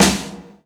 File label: snare classic; Sonic Foundry (Sony Creative Software); Ugly Remnants VOL-2; Beatstuff